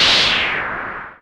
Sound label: Perc Hit, Noise